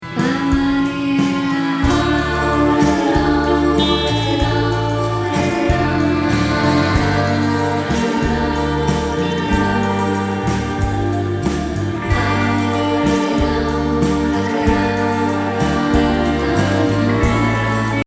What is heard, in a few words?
backing vocals, First sample is the complete track with all the instruments and the second are the vocals only.; Sounds kinda creepy, but that was the goal.